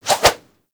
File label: Staff Swing Spin; Warframe